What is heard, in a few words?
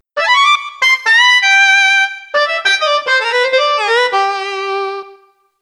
Hard Soprano Sax